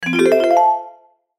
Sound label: CHIME ASCEND; Multimedia; Chimes; Blastwave